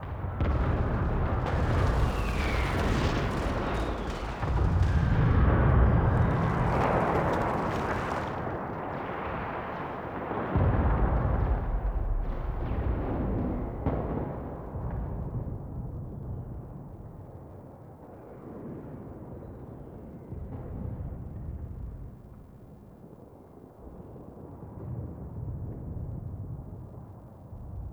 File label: Ambience; Warframe; Tau; Cinematics; AdisHeal